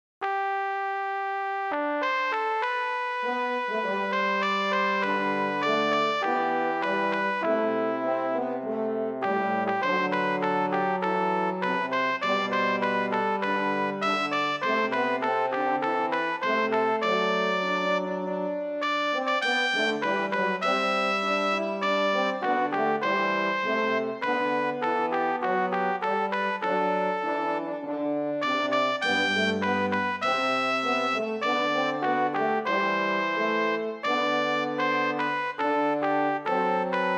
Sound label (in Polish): hejnal, Ustka